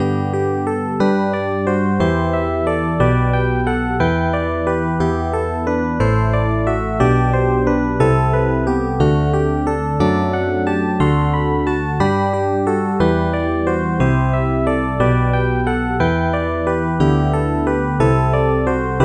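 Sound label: electric piano; legend ep 2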